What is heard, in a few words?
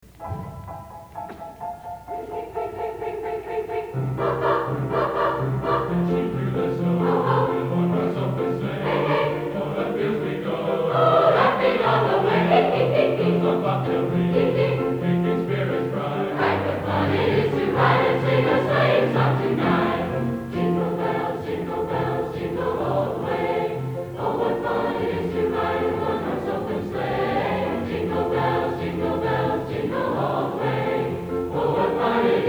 Jingle Bells